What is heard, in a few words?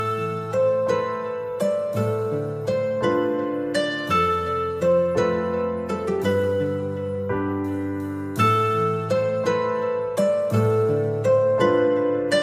bollywood music ringtone